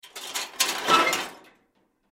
Metal Junk Scrap Object Pick Up; Scrapes; Foley

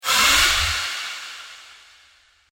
Poltergeist Attack; Ghost Sound Effects; horror sound effect

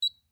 Technology, Beep, Electric, Typewriter; Miscellaneous Electronic; BlastWave

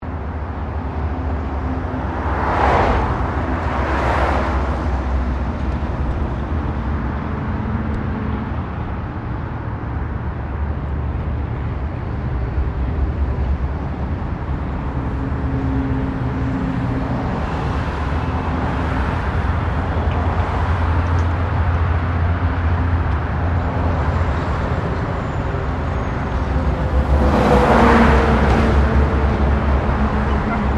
Traffic Cars Freeway Pass By; General traffic sounds; Traffic and Cars

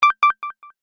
UI Back, User interface feedback sounds; Ui Set I; Computers; Electronics

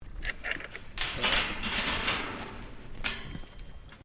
GATE CHAIN LATCH UNHOOK, Farm gate; Gate Sound Effects; Gates (Sound Effects:Doors)

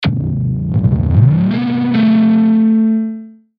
Ascend Power Up Zap Musical; Blastwave

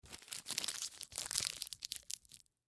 Bandage Crumple, Bandage Crumple Wrapper; Crinkling; Emergency

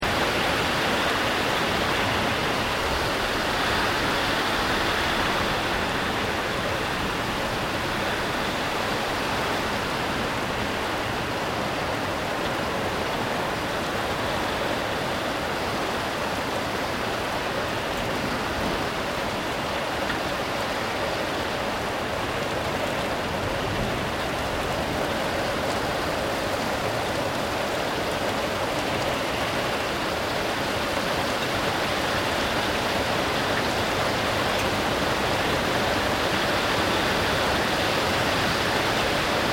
RAIN TORRENTIAL LOOP; Elemental weather effects; Rain (Sound Effects:Weather)

